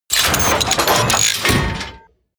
robot transformation metal

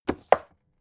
book shelve hard

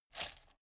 chain mail armor movement

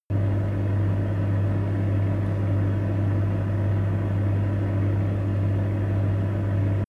fridge running

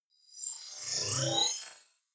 flyby splutter

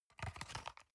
paper box small handle soft

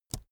keyboard laptop space push soft

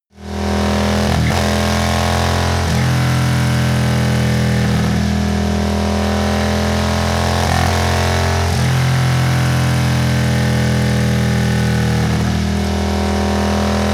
motorcycle harley engine thrusts

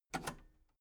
metal lever button radio enable medium

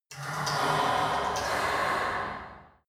mechanic steam

